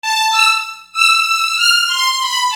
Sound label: String; Construction Kit; HIP-HOP; Sonic Foundry (Sony Creative Software)